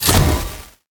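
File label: gold explo, attack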